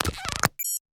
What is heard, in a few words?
pda objective; New 3D PDA Interface